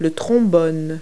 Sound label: trombone